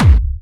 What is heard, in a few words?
Harder, kicks